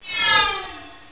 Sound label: Shoot; Jonah A VeggieTales Game